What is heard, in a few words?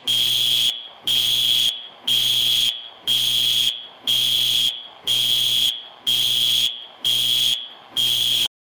a loud factory alarm signaling a shift change